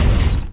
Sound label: dumpster move end